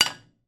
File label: household wooden spoon place inside saucepan